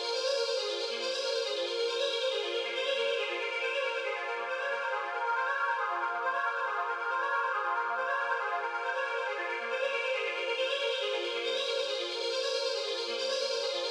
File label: Atmosynth 138BPM, A# minor; Melody & Synth; Vengeance Samples; Fusion Kit